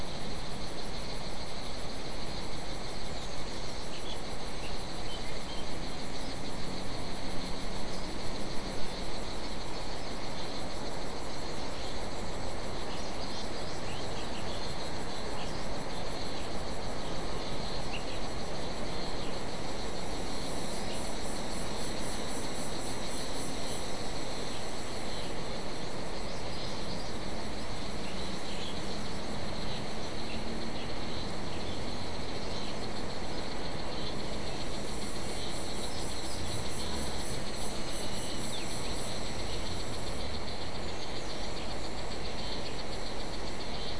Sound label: mainly insect cluster